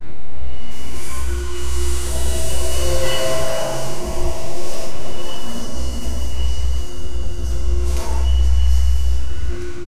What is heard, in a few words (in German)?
dstere und demonische sou, dark